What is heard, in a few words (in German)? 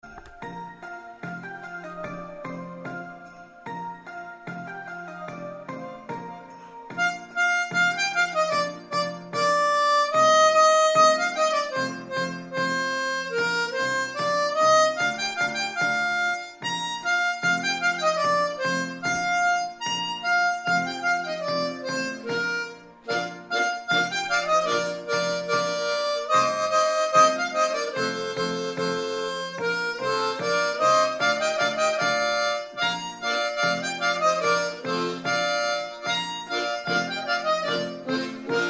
Lasst uns froh und munter sein Bb, Mundharmonika